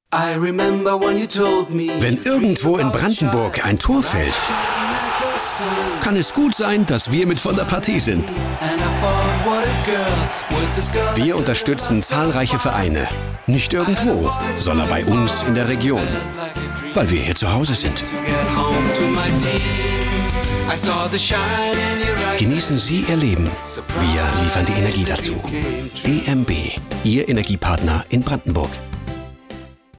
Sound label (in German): FUNK JUBEL; EMB FUNK 30